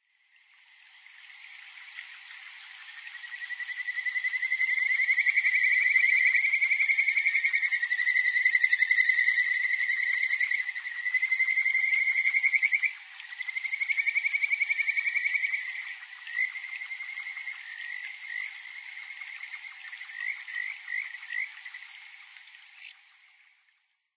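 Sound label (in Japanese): kajikagaeru, kajika frog; nashushiobara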